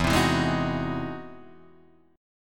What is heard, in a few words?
E Augmented Major 9th, Guitar, Open D